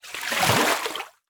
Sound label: Liquid Water Water Foot Splash